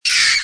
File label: bug death; merge-dragons-2